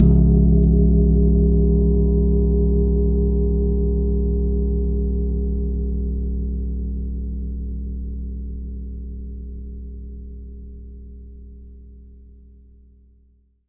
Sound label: Long Gong Single Hit; Longevity Gong; ETHNIC and WORLD PERCUSSION; Longevity Gong by Amani